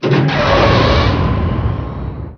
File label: pod thrusters